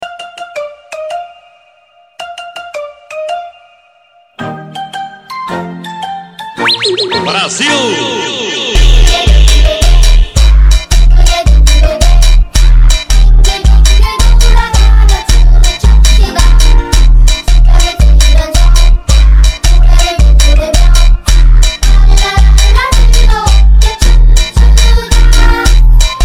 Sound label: Funk